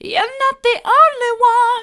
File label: soft, vocal